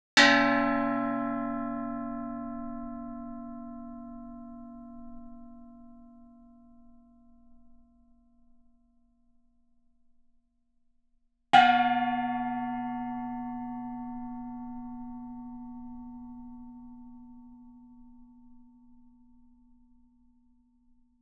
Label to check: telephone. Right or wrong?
Wrong